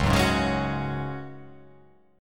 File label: C sharp Suspended 4th C sharp down, chord; strummed; JGuitar